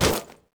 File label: pig attack miss; A Machine for Pigs